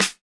Hiphop snare; Dry original

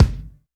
Rock kick; Reference Beat

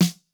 no effect j city pop 1980 snare

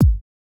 Modern kick, Electro

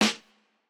Jazz hop snare

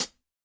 hihat, house

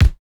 kick, house

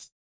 chillhouse hihat; Electro

